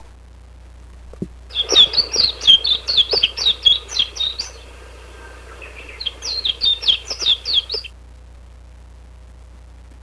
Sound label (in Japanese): mejiro